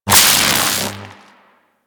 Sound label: Electricity Hit Effect; Warframe